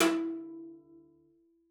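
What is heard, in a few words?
CELLO spiccato E4; 90 s Sample CDs; Xsample VOL-5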